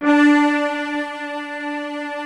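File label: string, orchestral strings; Greytsounds VOL-1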